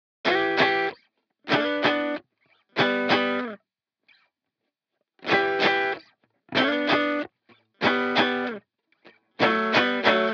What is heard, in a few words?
95 BPM G Maj Guitar Harmonies; Loop Stems; Cymatics; Menace